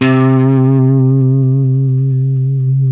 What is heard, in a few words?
LES PAUL LD, LESP MF C 3; Spectrasonics; Hans Zimmer Guitars VOL-1